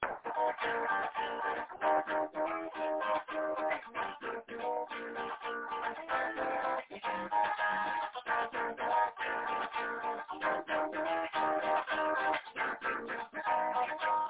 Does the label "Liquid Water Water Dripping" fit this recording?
No